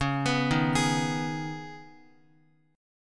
C sharp-7th Suspended 2nd-C sharp-x,x,1,4,3,4-8-down-Guitar; C#7sus2; arpeggiated; JGuitar